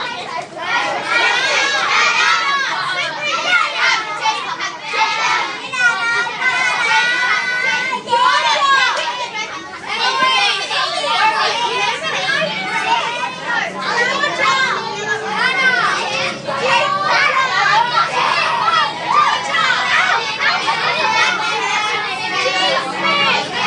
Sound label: bus noise